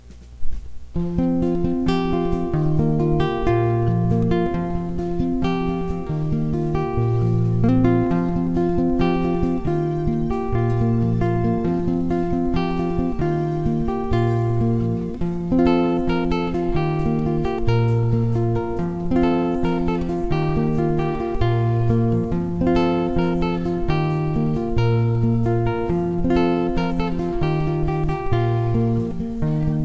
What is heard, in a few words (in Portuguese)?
violão